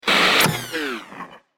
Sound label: POWER DOWN